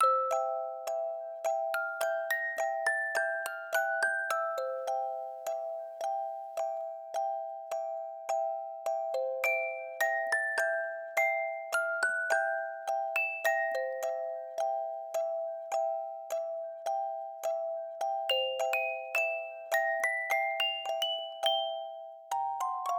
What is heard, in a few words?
Music Box